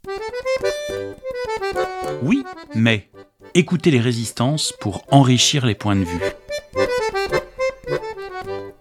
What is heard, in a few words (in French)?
jingle oui mais